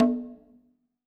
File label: CONGA LOW, HR-16 Drum Mashines; EdgeSounds